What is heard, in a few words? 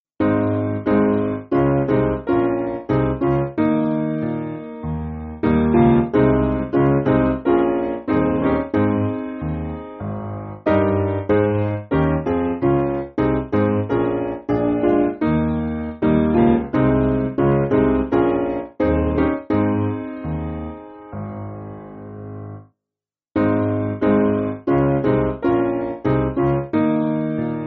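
Piano, Music Backing Files for Churches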